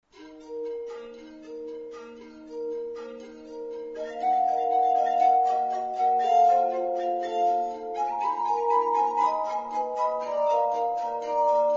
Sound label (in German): glasharmonika; weltmusik; grieg